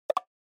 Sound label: Percussive Accent Low